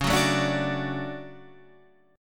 D Major C#, guitar

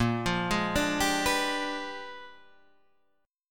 Bb 13th Flat 9th; Bb13b9

